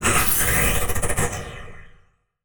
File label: Title Transition Delay Short